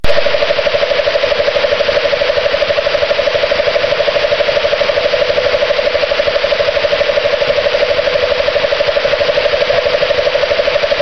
Fan Blades 38 Feet

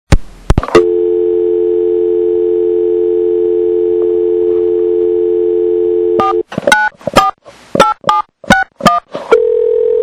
Phone Tap Audio

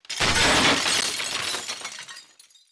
System Diagnostic Alert

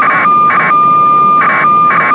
scada, scanner

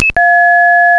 ringback, tones; 2600; phreaks and geeks

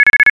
QUARTER, tones; 2600; phreaks and geeks

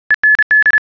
BSI End Alert, scanner

